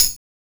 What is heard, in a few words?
TMB